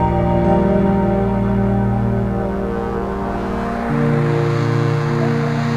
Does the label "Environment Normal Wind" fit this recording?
No